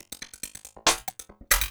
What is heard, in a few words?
LOOP, 140 BPM, Drum & Bass